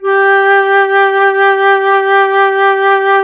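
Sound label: FLUTE FLUTE G 0; Roland LA; ORCHESTRA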